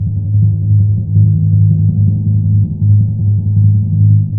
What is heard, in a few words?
PRC TIMPRO, Timp Roll; Roland LCDP03; Timp Rol Dry